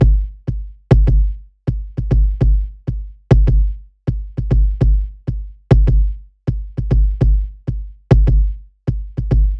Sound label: Kick